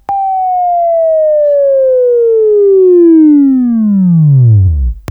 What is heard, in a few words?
Buzz; Labview-Program; Sweeping Training program